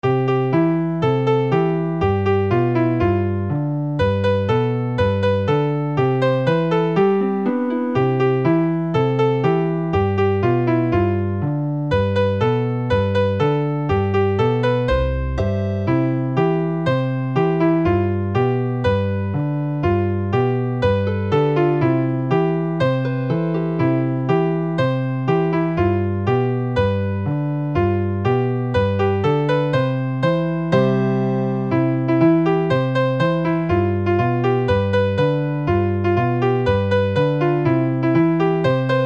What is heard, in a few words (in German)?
sternpolka